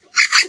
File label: squeak; OldThink